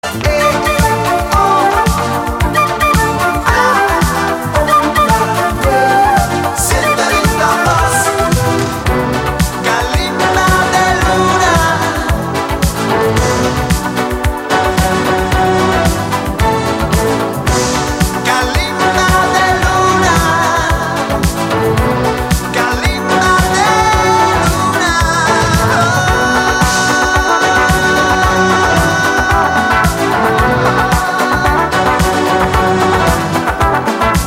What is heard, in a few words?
Kalimba ringtone; Нарезка одной песни,очень популярной в 80-ых.